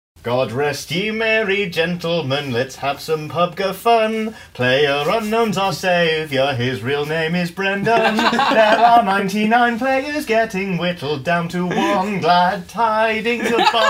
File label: Christmas Song; PUBG